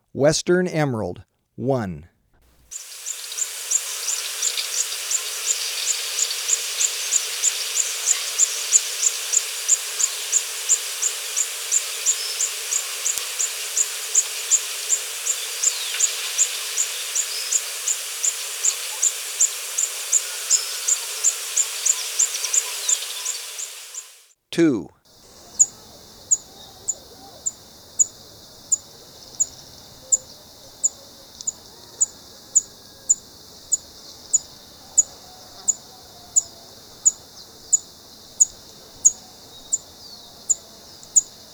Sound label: Western Emerald, Birds of Ecuador; S. America